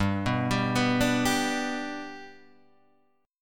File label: G Major down; G arpeggiated; JGuitar